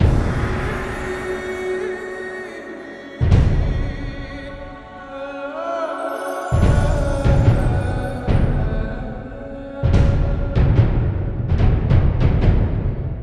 free spin intro loop; netent

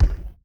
reel stop

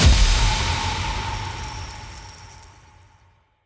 big win transition; netent

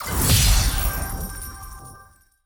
big Win Start

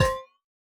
multiplier reel; netent

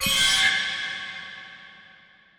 mirror Lands; netent

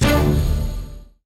small Win

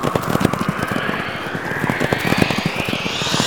excitement Spin Loop; netent

